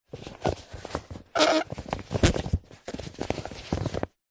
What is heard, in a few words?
leisure whoopee cushion inflated handling